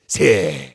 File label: Attack; Voljin